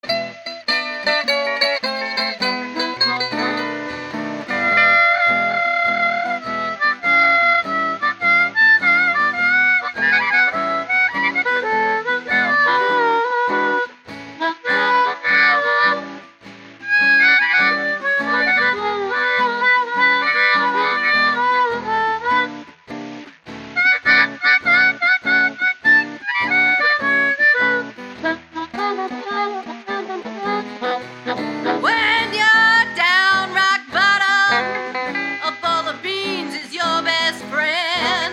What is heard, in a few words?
blues